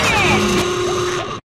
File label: scream horn